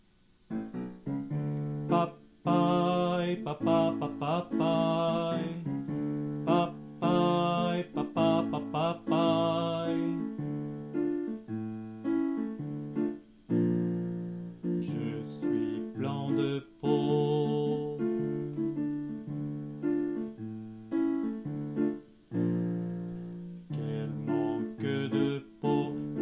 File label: alto